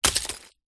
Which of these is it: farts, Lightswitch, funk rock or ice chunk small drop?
ice chunk small drop